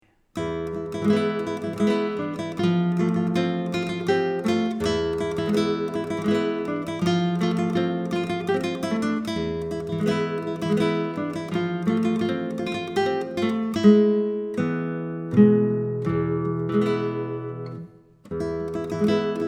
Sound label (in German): Span. Git.-Duo; leicht/mittelschwer; Akustische Gitarre/Klassische Gitarre: Solo